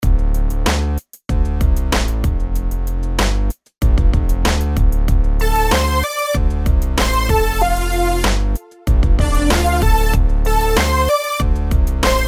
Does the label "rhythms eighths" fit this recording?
Yes